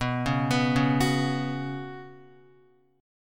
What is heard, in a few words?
B Suspended 2nd B down Guitar, Bsus2 chord; arpeggiated; JGuitar